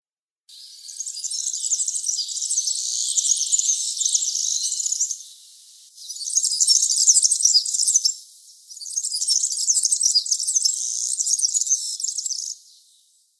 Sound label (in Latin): Serinus serinus